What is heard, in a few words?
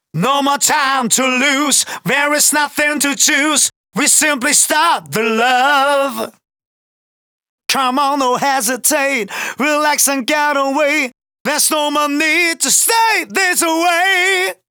Male Vocals Vers DRY, 128 BPM; Vengeance Samples; VTDS2 Song Kits